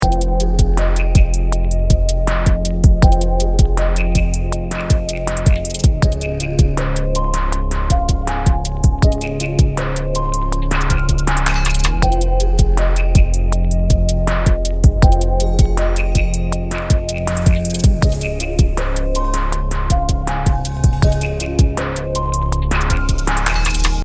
chill frog beat

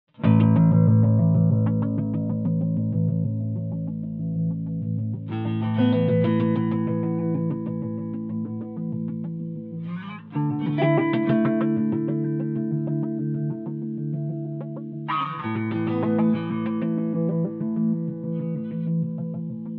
Fender Bass Sequencers; EQD

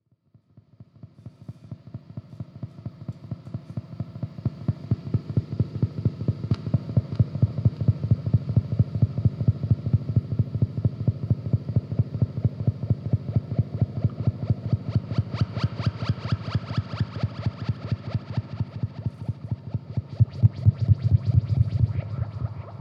bubbling

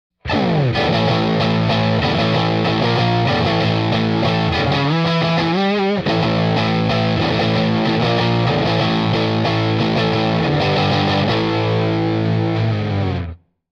Guitar Punk Rhythm

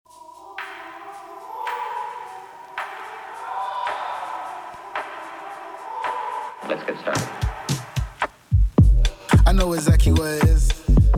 Groovy Lo-Fi House

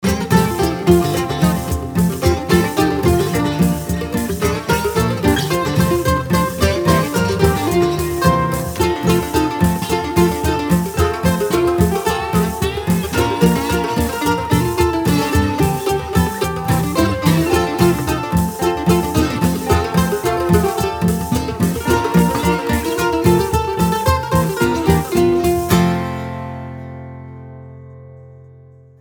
Jingle Bells Bluegrass

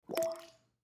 water drop

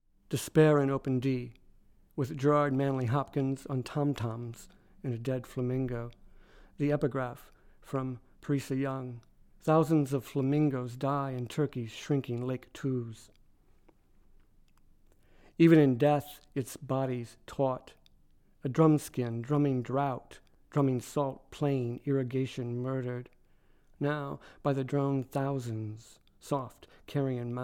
Despair in Open D

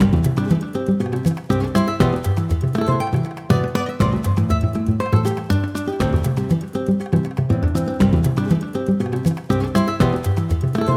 Happy Guitars

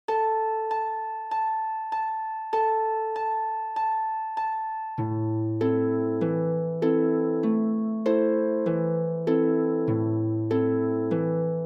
King of the Fairies Harp; Zephyr Rehearsal Tracks

